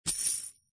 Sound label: coin